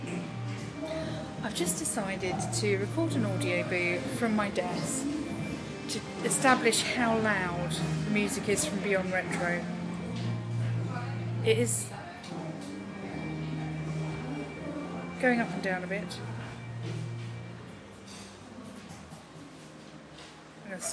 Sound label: loud sound